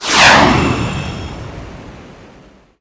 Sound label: boost; sonic